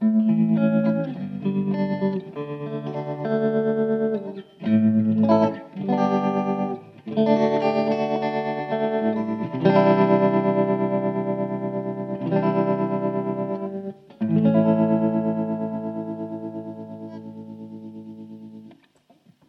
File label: Vox AC15 tremelo